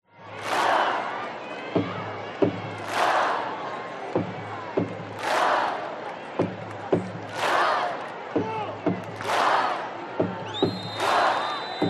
american football crowd clap chant response drummer build into cheers